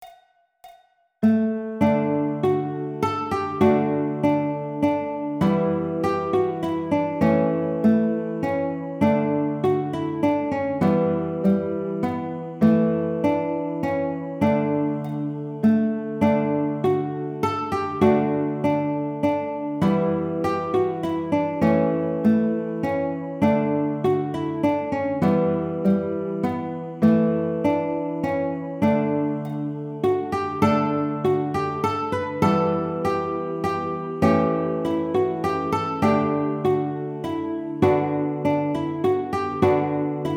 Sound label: Baritone, ukulele; Ash Grove; Baritone Solo; Jolly Roger Tuff-er Uke